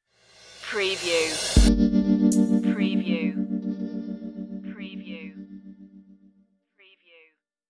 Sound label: spooky guitar pick stinger